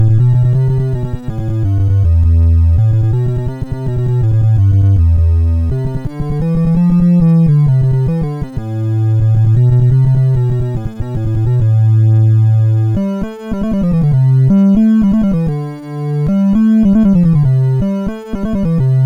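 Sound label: Pirati, MOS 6581 SID chip music, games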